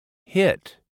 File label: hit